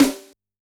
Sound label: Snr, drum